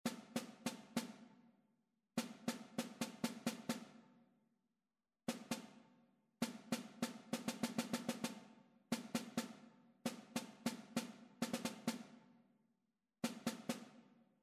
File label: rhythmic error detection